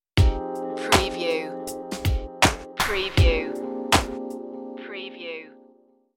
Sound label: rnb texture stinger